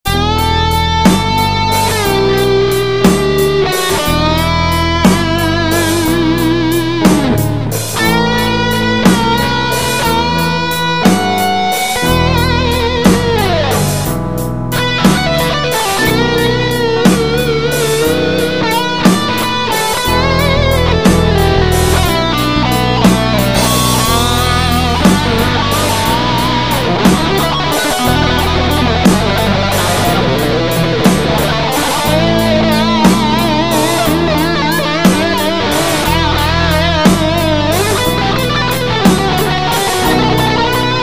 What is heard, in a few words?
12 Bar Blues; ps04 sample set